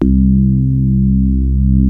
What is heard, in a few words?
DX PIANO; Wizoo - Powered DX